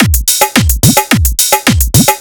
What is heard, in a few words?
All BPM Style Dholki Beat